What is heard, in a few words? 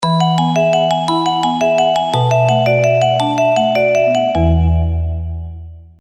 Vibes Oh Vibes; Sony Ericsson; Sania (T650); Surface; PlusFourSix; Lars Edvall